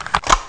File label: CLICKING